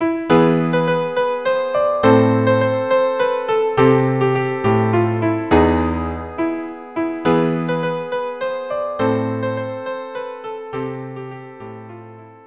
string trio; Carmen; tralalalal

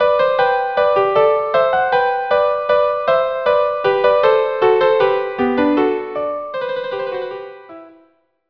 violin duo; La Rej Telemann

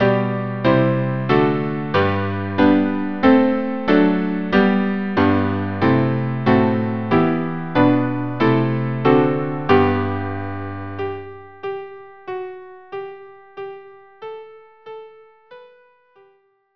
canon; Tallis

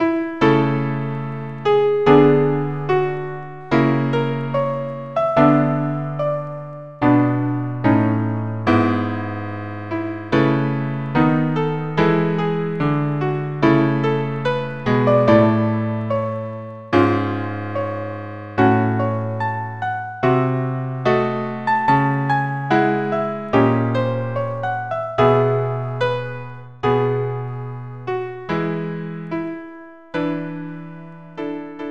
trio; schumann trio abend

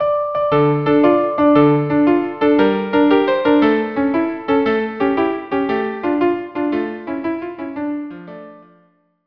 tarantella napoletana viola viola or viola cello; tarantella napoletana viola viola or viola cello duo

